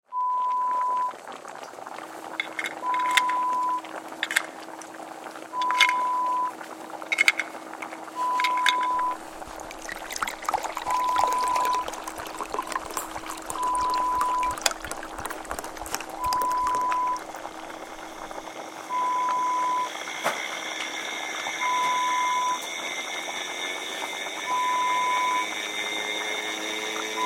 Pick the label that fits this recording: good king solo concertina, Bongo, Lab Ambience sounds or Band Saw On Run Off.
Lab Ambience sounds